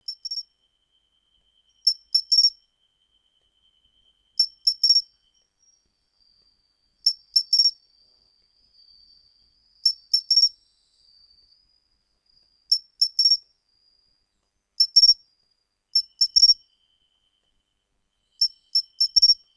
matumushi

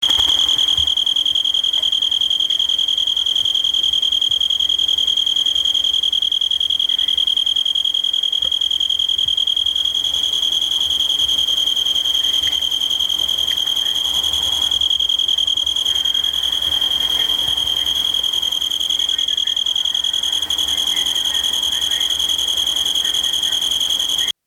shizuoka kantan

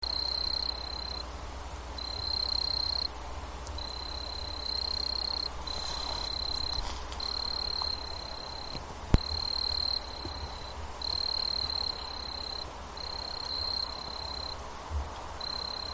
kayahibari; ヤブキリ

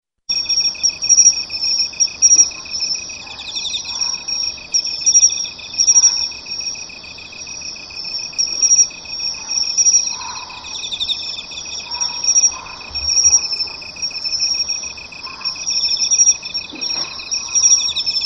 tougan to kantan; ヤブキリ

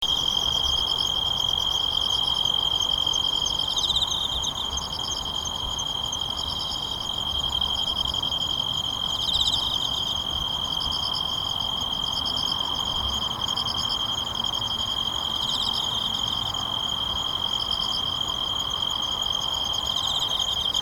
tamagawa kantan; ヤブキリ

